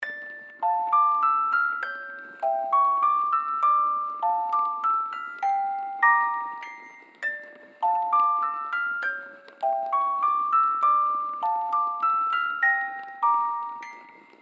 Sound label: Eerie music box suspense horror loop